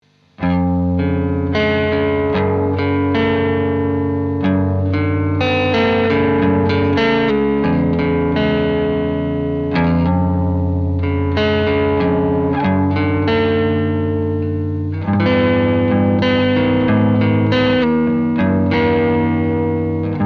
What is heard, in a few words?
thurbo drive guitar